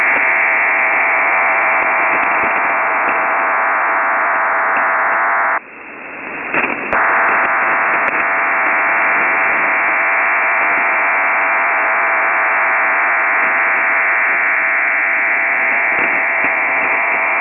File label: Codan 9001 16 x 75 Bd QPSK on 14056.5 kHz; MFA Cairo